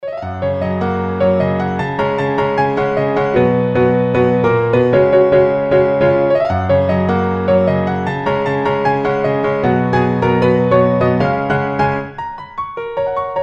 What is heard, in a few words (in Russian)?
virtuoznoe pianino; Виртуозное пианино; Классические